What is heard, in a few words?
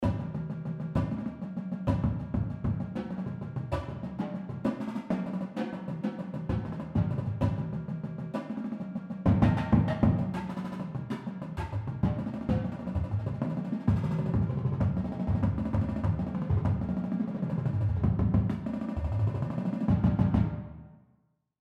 Triplet rolls Reading, percussion